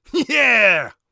joyous, Warrior Voice; Warrior Voice Pack

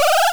upgrade, Retro Sounds 32-Bit

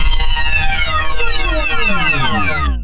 Retro Charge Electric Off

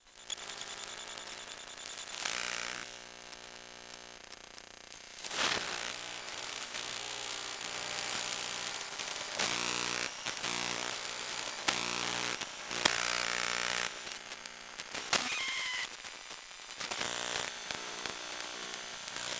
Electromagnetic Smartphone Sequence; Mono; Essentials Series NOX SOUND

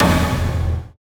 Shot Device; FREE SFX PACK VOL 2

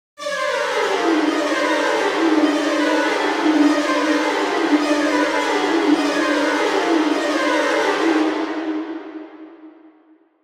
Adrenaline Strings High, horror strings

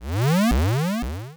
something big, square waveform; Square Waveform sfx

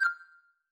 back style 5; inteface sfx pack 1; Back tones

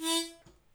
harmonica; abstract; Free Sound Assets

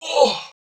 human; creature

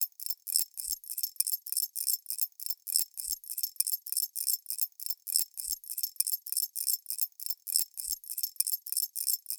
Ceramic Glass Shaker Dry; Sample Growing Stream 002; Ear Candy

